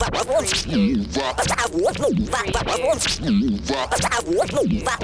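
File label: Scratch Crazy 95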